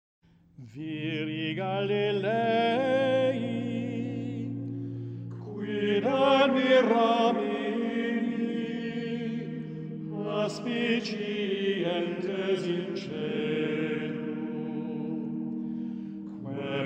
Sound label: Mode 7 Ascension Latin